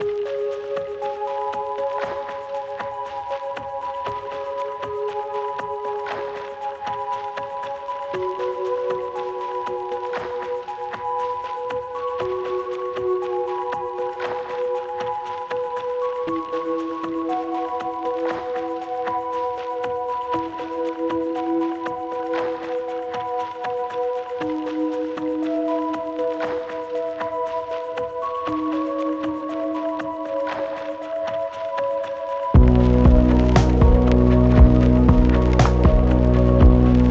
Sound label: Lo-Fi Joyride; Lo-Fi Music Pack Vol. 2